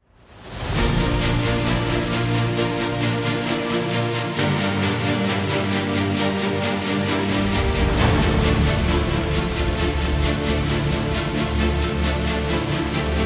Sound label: Epic Orchestra